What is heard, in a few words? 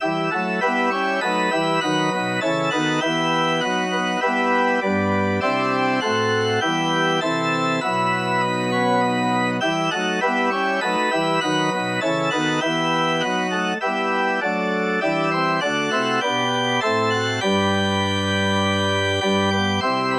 god rest 1st harm organ